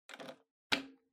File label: Portable Stereo Earphones Plug In